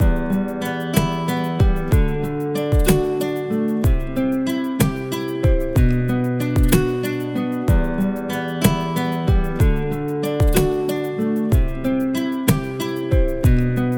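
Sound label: 6-8 arpeggio slow nylon; Guitar; nylon-pack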